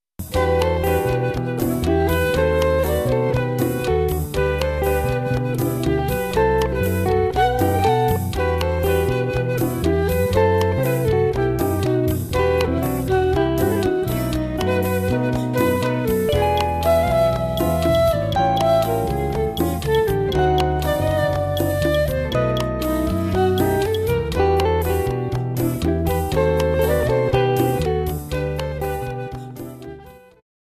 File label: bossa